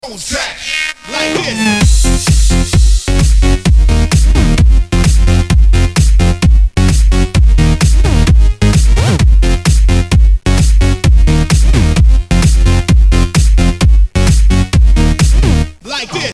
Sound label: surf